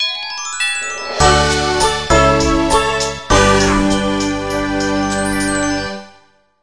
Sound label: Bgm open chapter